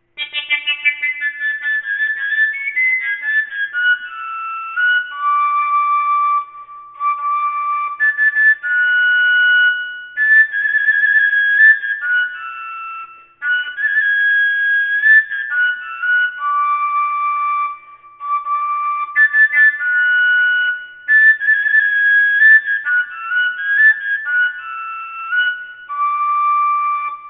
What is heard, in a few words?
koncovka d; whistles; overtone flute